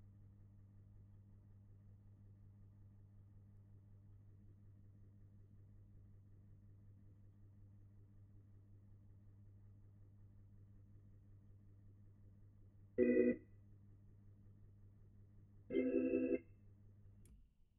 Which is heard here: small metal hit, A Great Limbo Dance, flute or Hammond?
Hammond